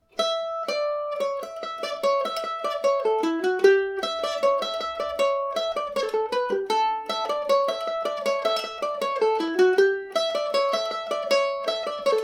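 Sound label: A mixolydian, Irish mandolin; eddie duffys favourite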